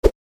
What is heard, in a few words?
launch; holidays; snowbrawl